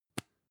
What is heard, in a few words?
lipstick open soft; haus-garten; badezimmer